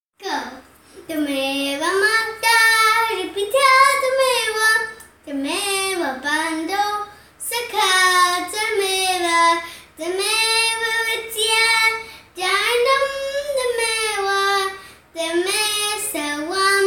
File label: Twameva Mata Cha Pita Twameva Mantra Sung